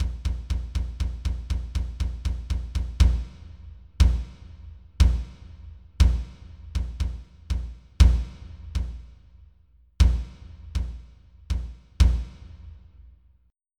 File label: Kick Q2 & DW Maple, Soundfont; Onj's Soundfont